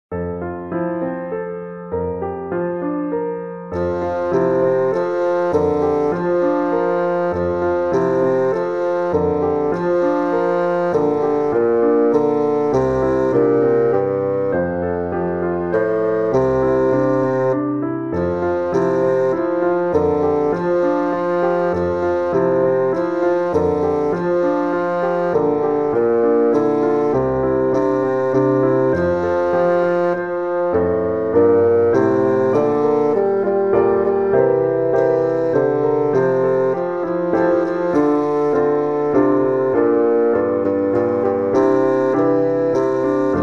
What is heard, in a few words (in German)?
Klabauterjazz